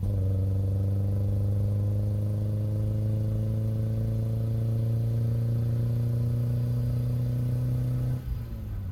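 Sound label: engine low loop; Blind Drive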